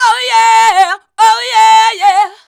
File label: VOX, Singin